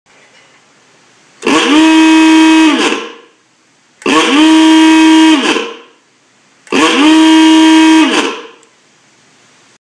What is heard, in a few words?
ahooga horn